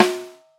Subtle Reverb Snare F# Key, snare drum tuned to the F# note